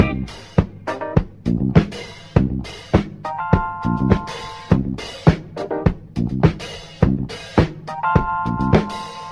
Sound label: full of keys and bass, Loops Phonky